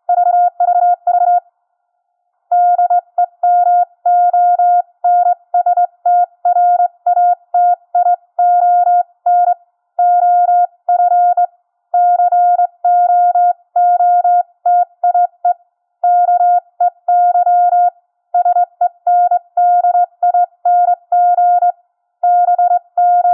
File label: cootie sending demo